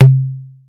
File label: small reverb tom drum one shot c key, Royality free tom single hit tuned to the C note.